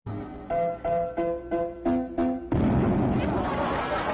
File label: explosion